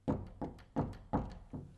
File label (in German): Holztür anklopfen